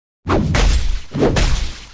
Axe

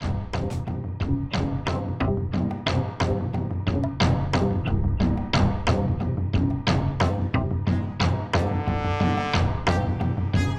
Dark Forces

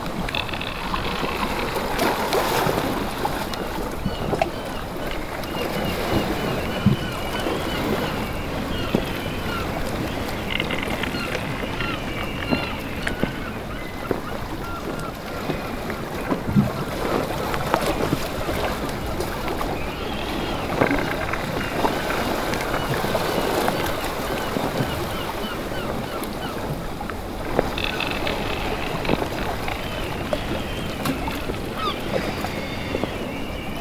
On A Wooden Ship At Sea

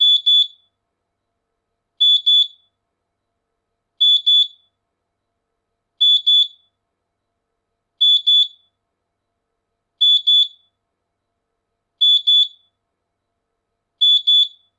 Alarm Pips

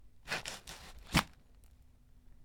Shuffle And Jump

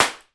Cap Shot

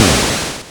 8 Bit Cymbal Kick Or Impact

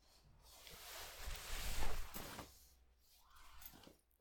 Get Up From The Ground

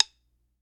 Drum Stick

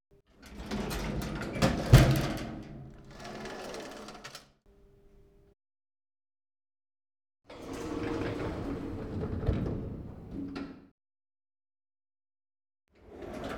Elevator

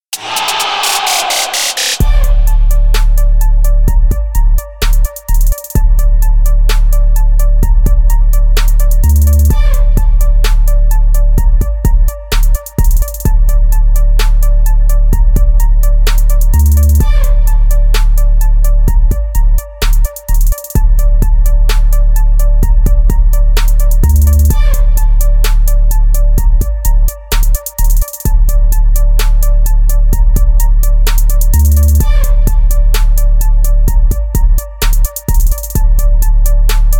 Trap Beat

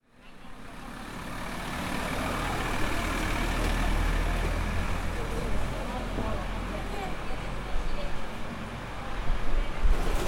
Van

